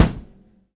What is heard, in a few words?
silky bass drum g key, Royality free kick drum one shot tuned to the G note. Loudest frequency: 512Hz; kick drum samples